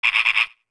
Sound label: Gekko Climb Chatter; Gekko chattering and talking (2 pitches) while climbing walls & ceilings